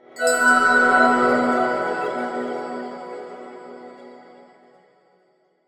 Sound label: Level Unlock; Candleman The Complete Journey